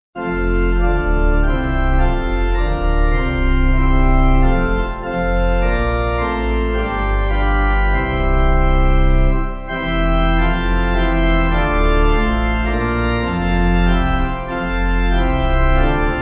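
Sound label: Pipe, Pipe Organ backing; Dunfermline 8.6.8.6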